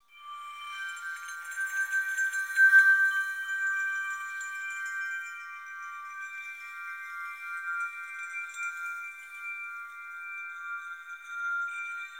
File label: Water Chimes; Raven; Dripping Water